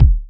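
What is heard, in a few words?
kick, Boss DR-202; Drums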